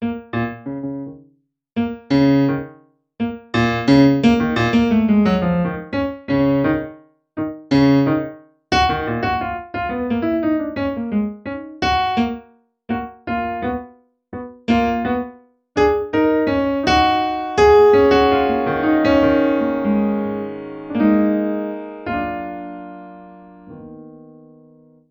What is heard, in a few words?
Piano Intro, Cinematic Funk; Sonic Foundry (Sony Creative Software); Cinematic Funk Urban Tone Poems